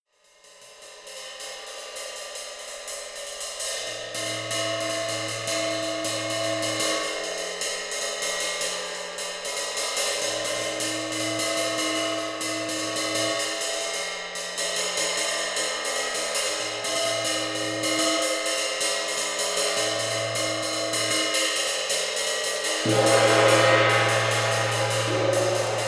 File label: Nightmarish